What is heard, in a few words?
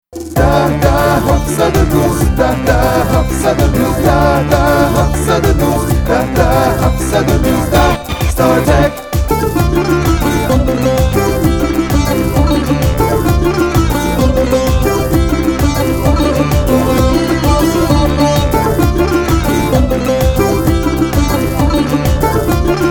long jingle